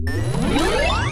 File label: super dunk, basketball; Nickelodeon Basketball Stars 3; ThrillGames